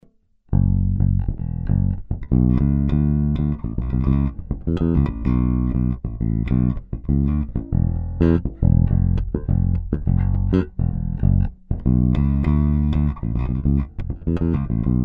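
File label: fender deluxe passivo groove, bass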